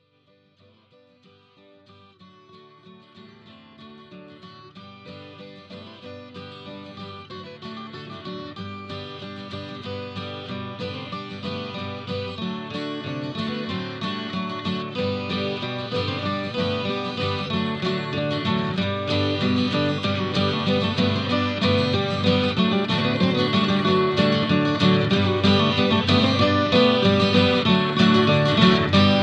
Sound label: clog song sample